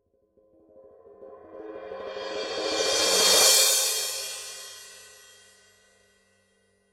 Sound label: Paiste PST3 16 crash swell